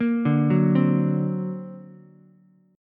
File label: D Augmented 7th Ukulele, chord; arpeggiated; 3,2,2,3; JGuitar